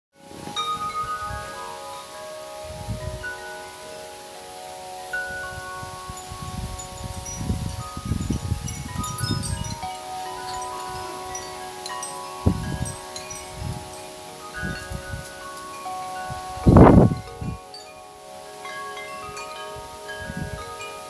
Sinos

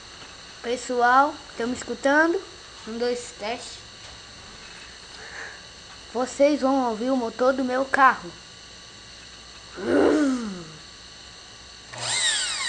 batida

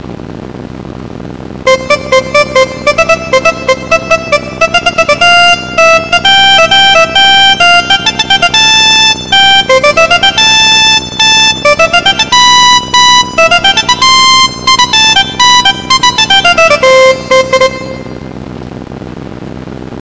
musicicinha

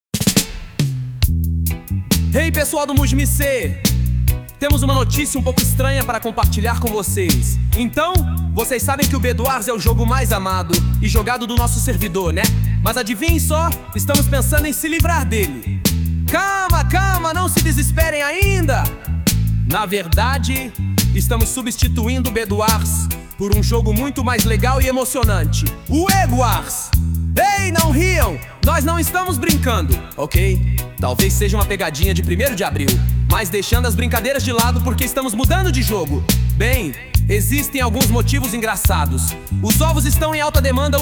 Versão Reggae

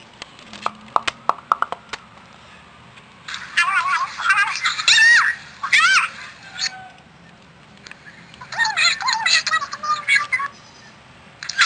soms celular

